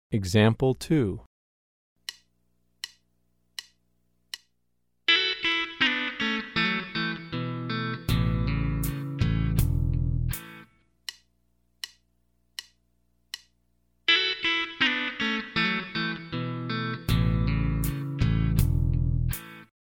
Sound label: Country Rock